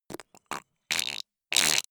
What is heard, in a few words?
creature quack